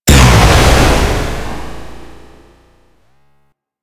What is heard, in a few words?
Drone Explode; Super Drone Osprey Min